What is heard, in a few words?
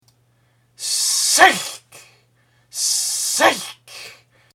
raygun; rayguns